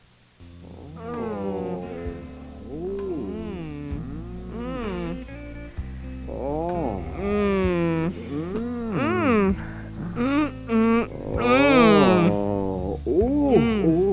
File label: moaning; No Hang-Ups Tapes